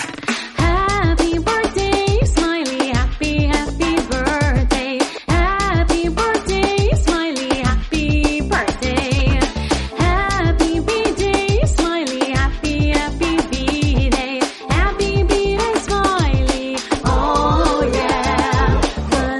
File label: Happy Birthday English Song